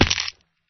fall big, damage

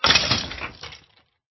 death, skeleton; ayuncraft-1.5

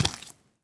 step, mob zombie; ayuncraft-1.5

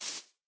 grass, step; minecraft

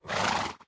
idle, horse; minecraft

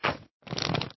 jump, magmacube

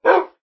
bark, wolf; eaglercraft-1.5; mob

